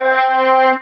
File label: talk box choir ah